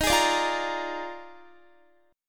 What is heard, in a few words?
Eb Major 7th Suspended 2nd Suspended 4th Eb Guitar 4E 4A 5D 5G 5C 6F; strummed; x,18,18,19,17,17; EbM7sus2sus4